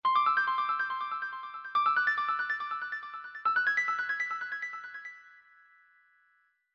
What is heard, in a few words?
ascent sms